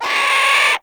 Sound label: Bird Of Prey Vocal Scared